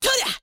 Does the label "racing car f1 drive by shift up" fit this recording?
No